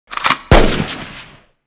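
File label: reload shot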